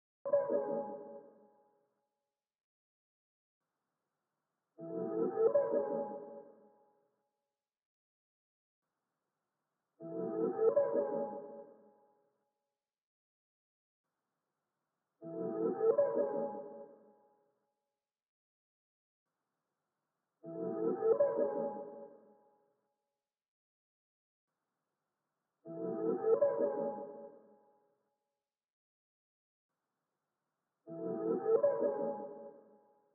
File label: 92 BPM C# Min Accent; Loop Stems; Cymatics; Ballad